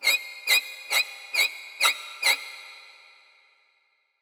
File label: violin horror